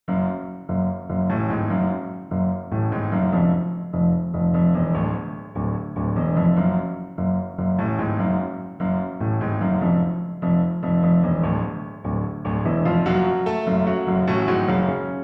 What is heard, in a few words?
Solo, Piano